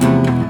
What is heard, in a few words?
Guitar; ClubFusion; Mexicana Chillitime